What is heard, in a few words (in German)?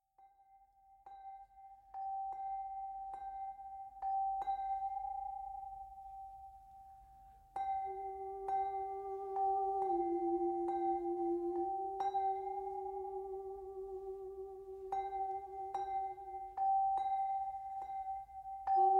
Wiegenlied